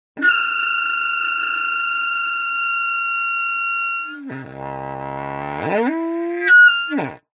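foley blowing air into pipe with squeaky mouth piece weird sound design element; zapsplat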